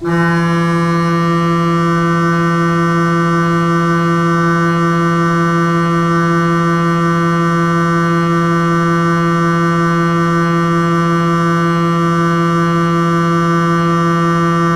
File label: BASSE TROMBONE, jazzband limonaire